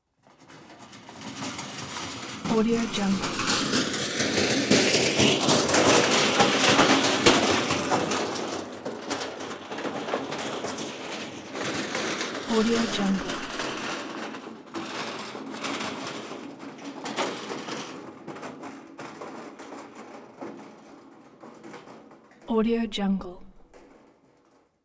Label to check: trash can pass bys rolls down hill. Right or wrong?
Right